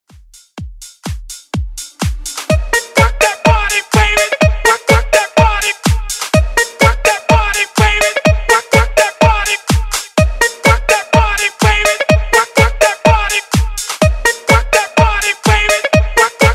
House Redrum

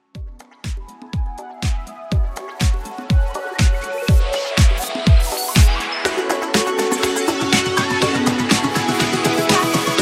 House Classic Edit

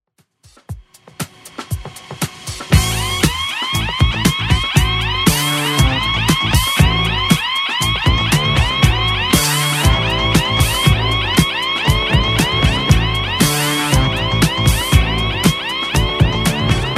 70s DJ Intro Outro 118bpm

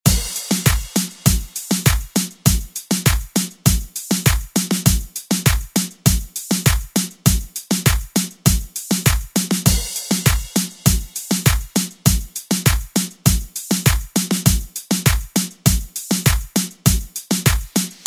90s ReDrum; DJ Mhark

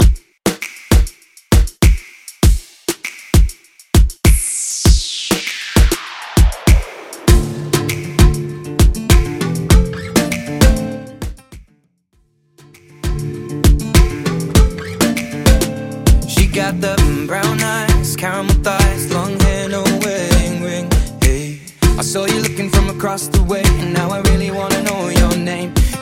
Top40 Pop Mixshow Re Drum 99 Bpm

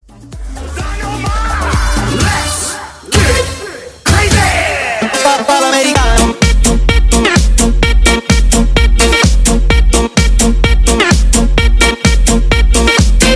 MashUp Re Drum

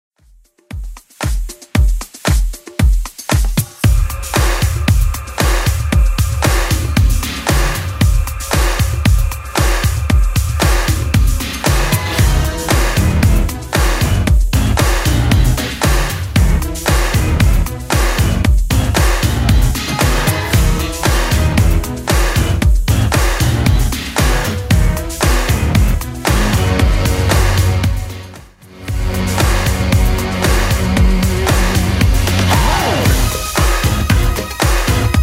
80s Club Edit 115 bpm

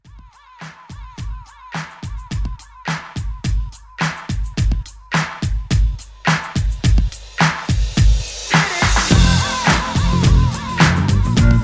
70s Re Drum 105

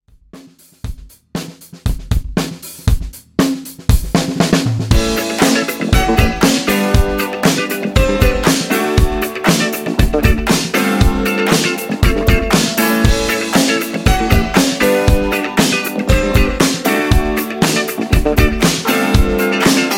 70s Disco ReDrum 118Bpm

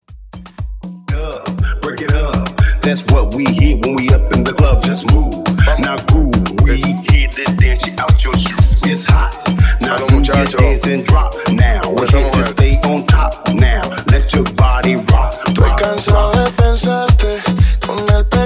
Afro Hype 120 BPM

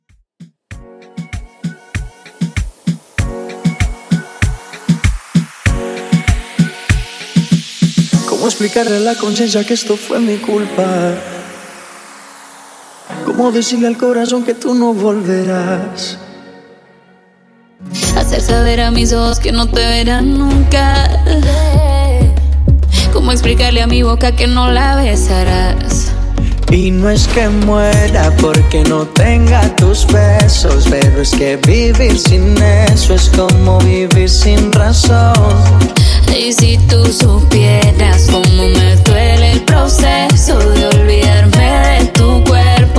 In Outro 97Bpm Clean

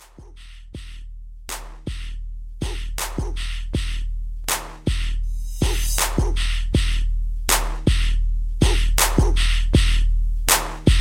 Hype Re Drum

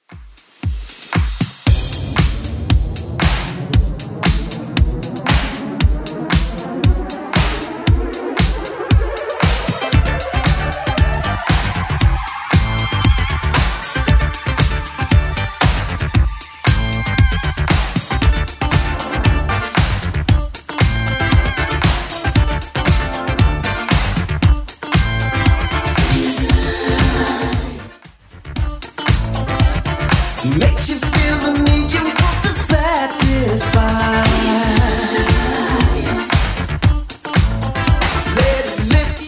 80s Club Edit 116bpm

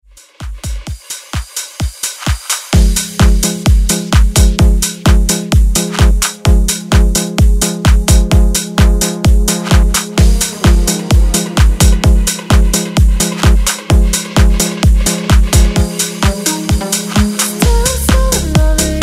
Dance Redrum

